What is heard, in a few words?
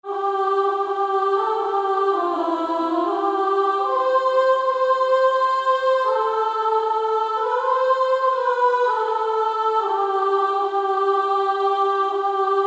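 Female Choir, soprano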